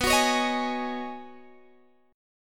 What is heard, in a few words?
B 6th Add 9th B down Guitar Open G